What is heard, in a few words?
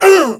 pain, male; bootsector male; midnight guns; bootsector male 00